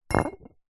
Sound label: glass bottle 1 ltr set down tiled floor